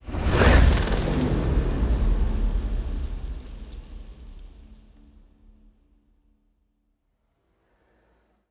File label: ship destroyer move; Stellaris; toxoids; ships